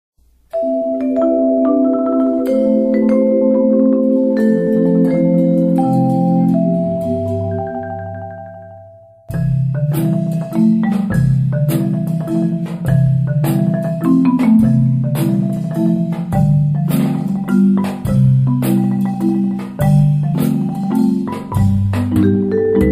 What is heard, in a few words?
waltz